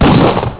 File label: hit